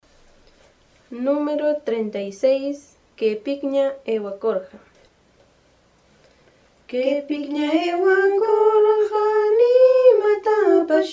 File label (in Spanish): cancion